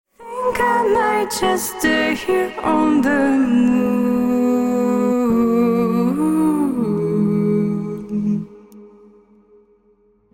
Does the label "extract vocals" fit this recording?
Yes